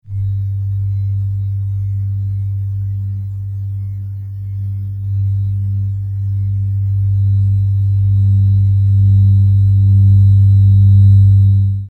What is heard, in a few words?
generator; FXHome